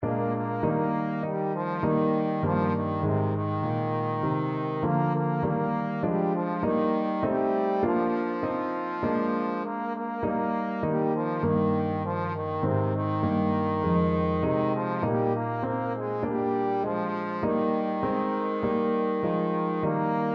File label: trombone